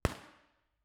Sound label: IR Odeion; B-format; Ambix; IRspatial Ambix example